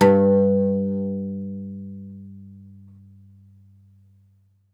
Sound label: OUD G1, Plucked Strings; E-MU Producer Series Vol. 7 – Old World Instruments